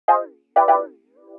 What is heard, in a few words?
house synth 125 A, keys